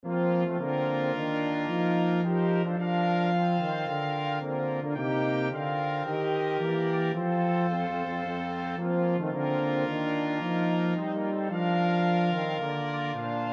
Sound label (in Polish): hejnal